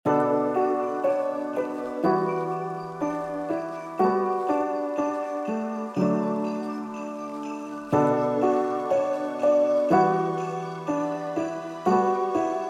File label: Christmas Lounge lofi; carol of the bells